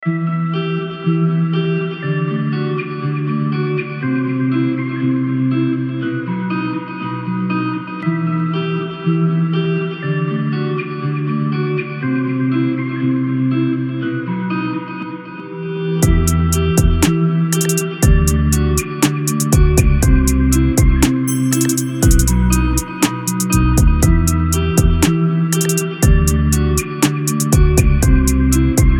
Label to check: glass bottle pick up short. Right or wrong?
Wrong